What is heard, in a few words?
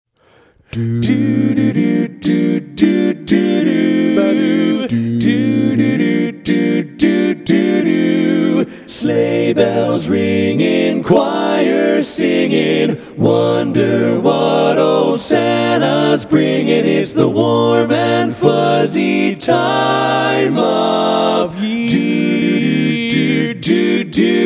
Warm And Fuzzy, Christmas Music